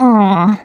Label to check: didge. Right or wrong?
Wrong